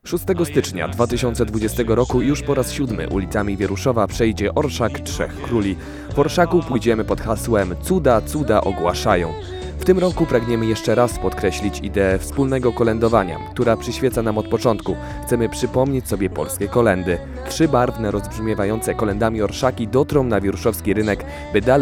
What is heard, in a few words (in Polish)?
jingiel